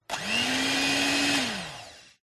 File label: household kitchen electric whisk on off